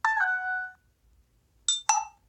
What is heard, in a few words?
Message Tone